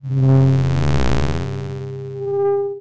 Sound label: Science Fiction Sci-Fi Electronic Distorted Energy Beam